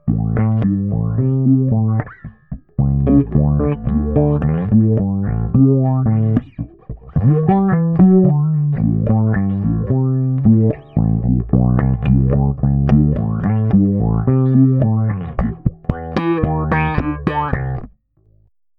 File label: envelope